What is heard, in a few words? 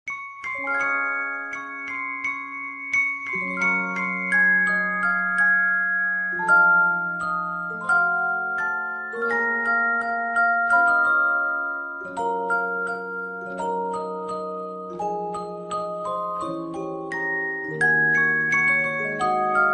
music box